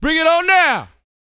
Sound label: VOX, Vocal, R&B Dance, HIP-HOP